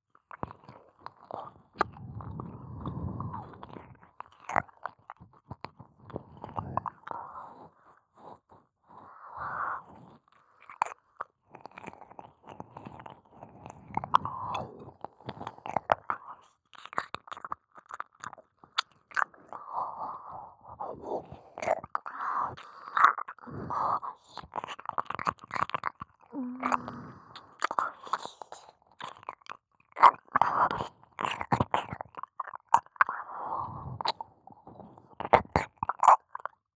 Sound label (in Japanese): 耳舐め・激しく, ASMR